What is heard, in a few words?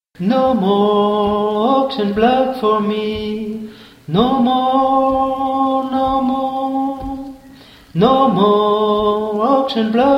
No more auction block tenor